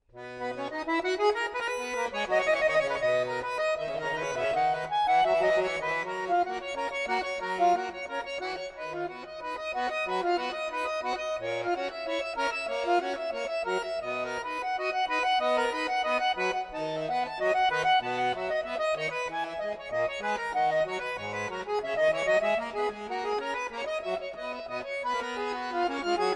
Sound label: Prelude in B Major